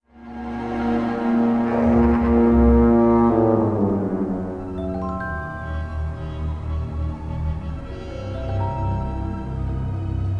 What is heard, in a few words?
tomb, music flourish